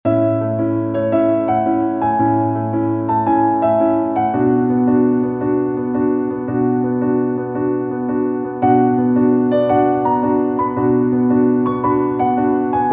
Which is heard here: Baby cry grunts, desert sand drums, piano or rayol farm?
piano